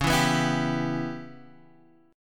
C sharp Minor 7th Flat 5th C sharp Guitar Standard; C#m7b5 strummed; x,4,2,4,5,3; JGuitar